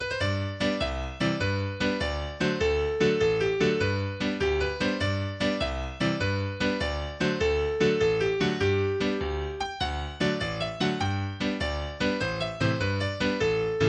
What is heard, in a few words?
Quick Step